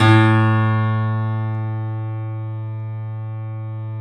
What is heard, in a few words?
A, 3-D Audio Collection, 3D Pianos, Yamaha C7; E-MU Producer Series Vol. 5